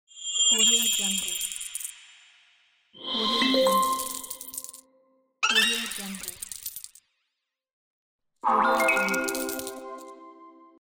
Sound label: game coins